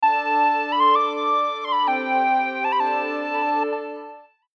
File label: Celtic Synth, ringtones; ringtone and notifier tones